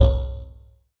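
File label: ringing hit sound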